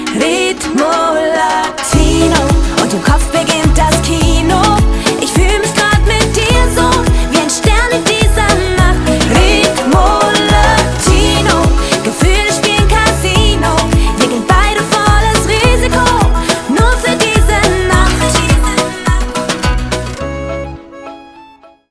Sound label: Ritmo Latino